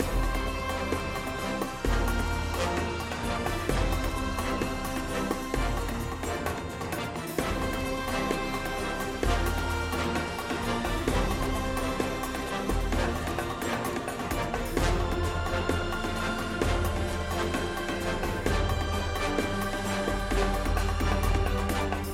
jackpot; 3 Tiny Gods; Foxium

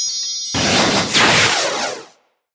Symbol; Temple of Luxor

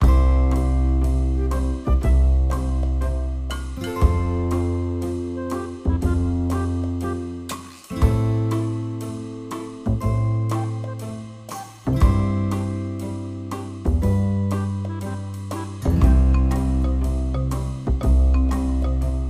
bg music; Skillzgaming; fruit Blast 92 Desktop

